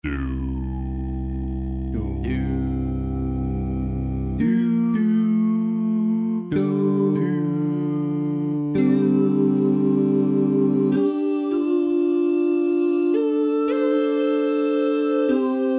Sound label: Barbershop; Soundiron Questionably Barbershop